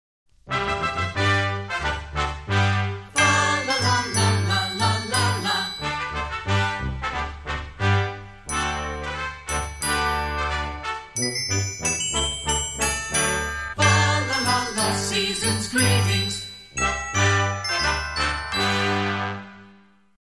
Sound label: Deck The Halls, Brass Band and Small Group